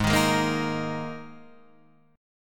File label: G sharp Suspended 2nd, G sharp; G#sus2 Chord {4 6 6 3 4 6} strummed